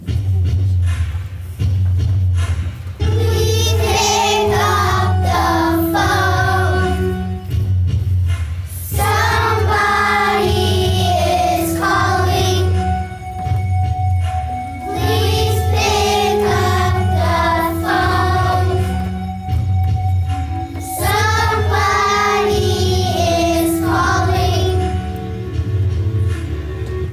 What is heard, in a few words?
ringtone